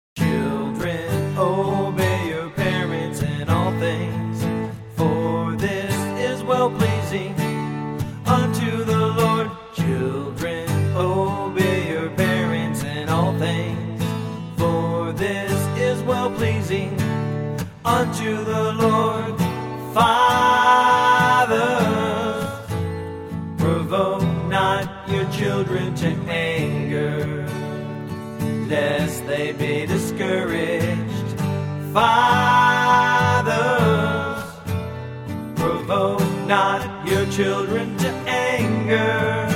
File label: Acoustic; Colossians 3 20-21